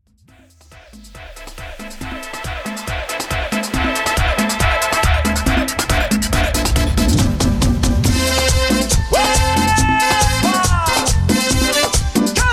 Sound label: Extended 139 BPM, Intro